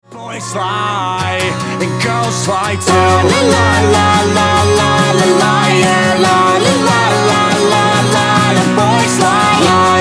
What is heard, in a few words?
acoustic